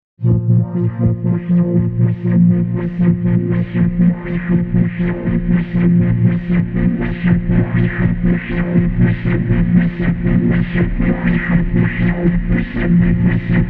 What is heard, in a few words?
drone